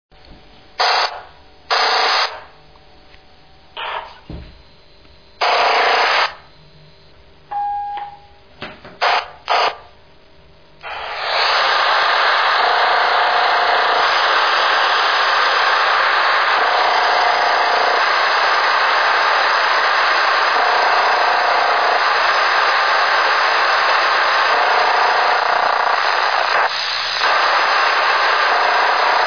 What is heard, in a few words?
TETRA on LPD